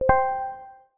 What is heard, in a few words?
Transparency, AirPods